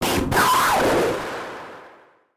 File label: monster